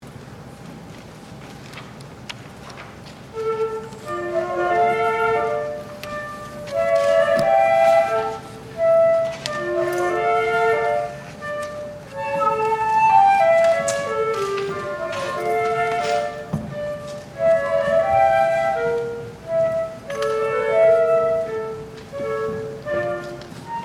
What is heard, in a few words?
duetto, Flutes; Andante con Variazione; Mozart: "Duetto I" (Andante con Variazione) from Trois Duos pour deux Flutes; Lititz Moravian Collegium Musicum concert